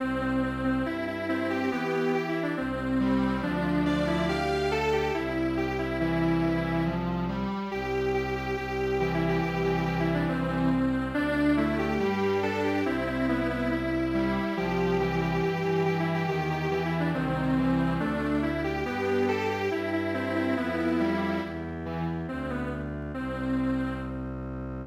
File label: Strings Quartet, midi traditional